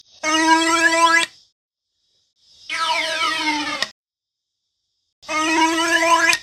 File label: funny tube